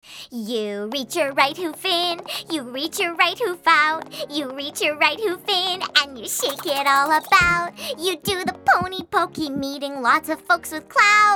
Acapella; Season 1

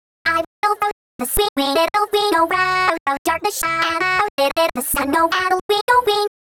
VOX Glitch, 160 BPM

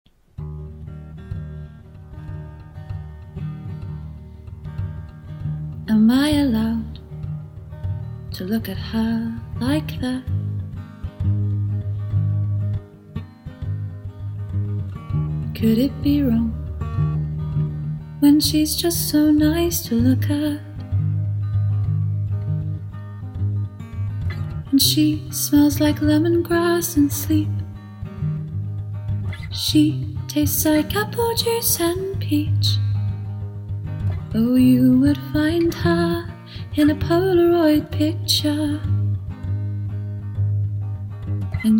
[F] [Cover][Guitar][Soothing]

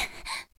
Moan

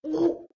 Gag; Lewd SFX

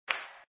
Sex Slap; Lewd SFX

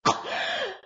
Cough; Lewd SFX

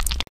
Slide; Lewd SFX

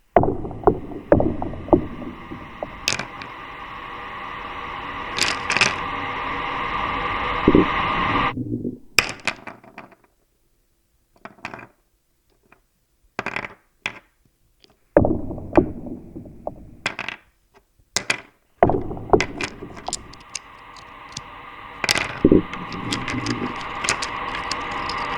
Eerie Unsettlement; Pony Music Archive

